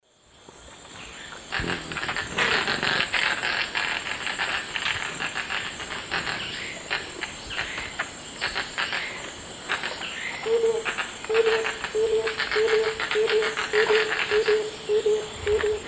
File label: Capito auratus, calls and song; S. America; Eastern Lowlands